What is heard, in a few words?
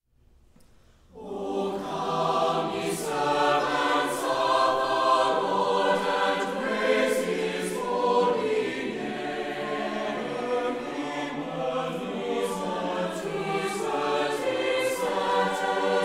choir; advent music; ocomeyeservants